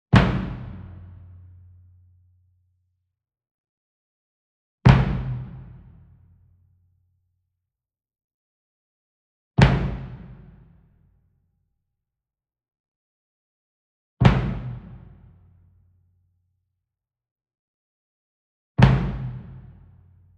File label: Bass Drum Marching Flam Soft Beater Medium; SINGLE DRUM; boom library; Cinematic Strikes Construction Kit